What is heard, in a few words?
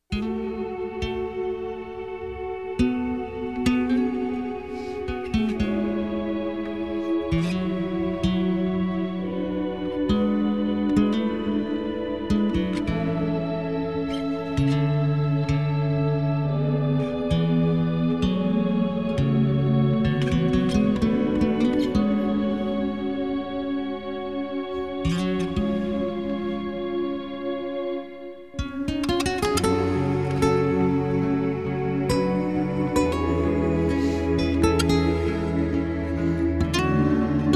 Theme Of Sadness (Guitar Version); suikoden